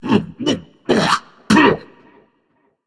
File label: clear throat; smite; hades